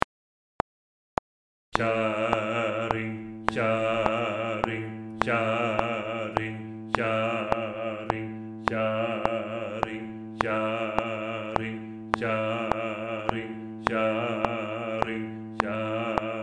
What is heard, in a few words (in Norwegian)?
lydspor Bass 2 Click; Kjerringa med staven clicktrack